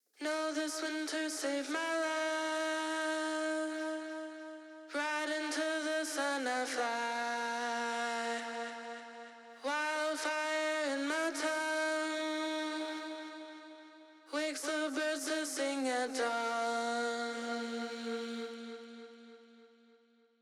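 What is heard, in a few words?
Disto Vox PSP ECHO Stereo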